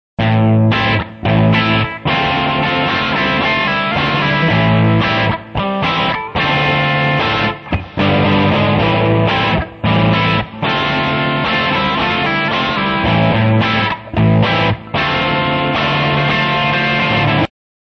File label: crow riff